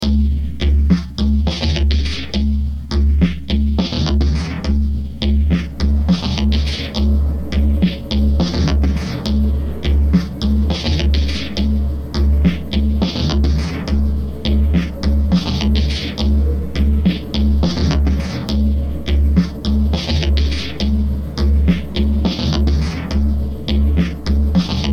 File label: Electro; Beds Loops; RoosterFX